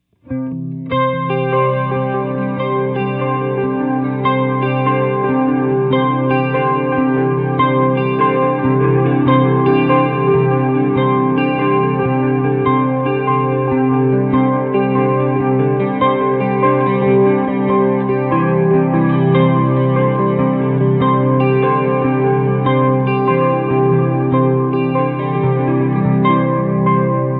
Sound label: delay, Analog Delay; Retro Sonic